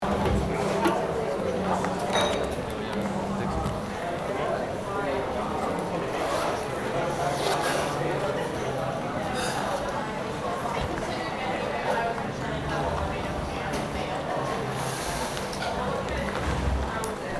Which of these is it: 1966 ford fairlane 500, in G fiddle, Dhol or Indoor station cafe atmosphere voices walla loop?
Indoor station cafe atmosphere voices walla loop